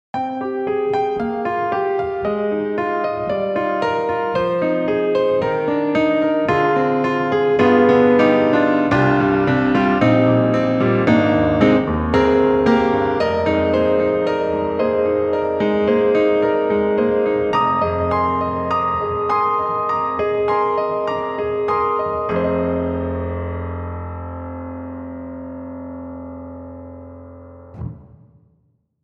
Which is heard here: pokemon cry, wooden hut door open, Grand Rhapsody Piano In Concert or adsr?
Grand Rhapsody Piano In Concert